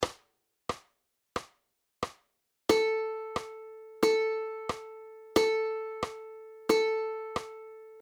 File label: Half Notes; ukulele; understanding rhythmic notation